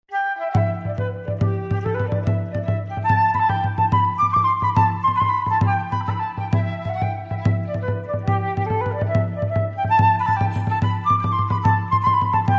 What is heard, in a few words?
belfast hornpipe, arpa; trad irlandesa